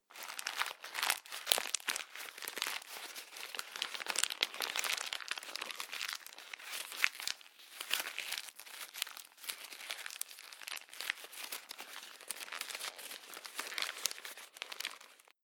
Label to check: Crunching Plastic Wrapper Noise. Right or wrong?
Right